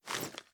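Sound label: pistol reload empty raise; gmod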